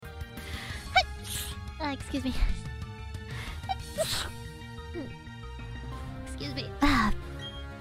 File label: Sneeze